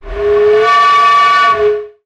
sound design effect air pipe pressure

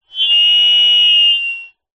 technology cb radio two way feedback

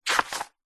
household book thick page glossy turn; zapsplat

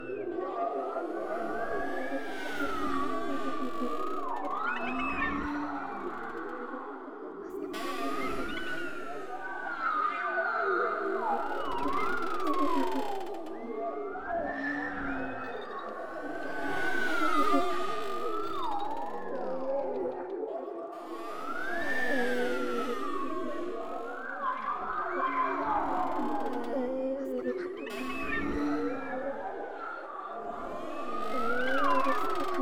GHOSTS Atmosphere

